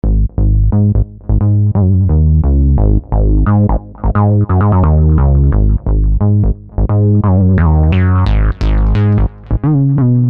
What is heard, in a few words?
3 Saw Bs; V-Synth